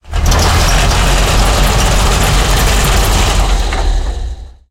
turn rattle, tanks; War Thunder

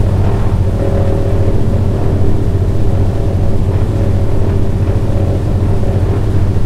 c46 cockpit l, engines; War Thunder

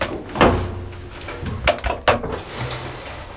Vehicle reloads; Red Orchestra: Ostfront 41-45

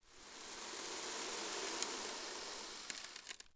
desert sand; Ambient; Civ4 warlords

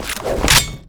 hiker equip

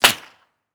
bullet flyby pistol; Rust

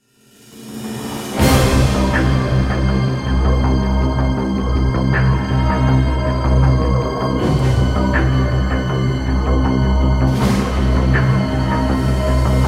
Campaign Music defeat; DCS

